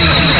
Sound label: turret loop